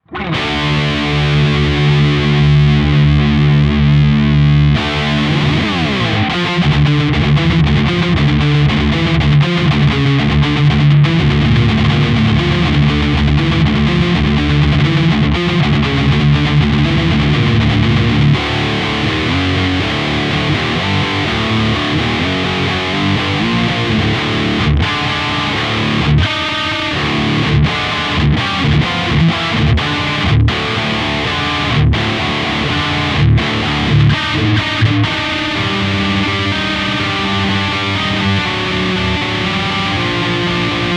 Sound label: Yamaha THR30 II Boutique Hi Gain